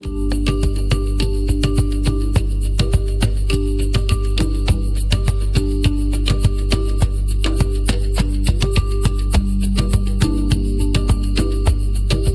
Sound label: jungle harmony, tropic quest music